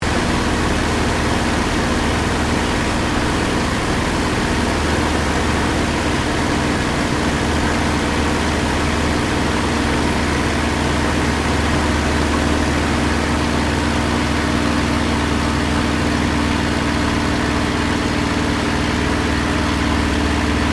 AIRCRAFT SUPERMARINE SPITFIRE MK-XVI APPROACH INTERIOR; Airplanes; Airplane Sound Effects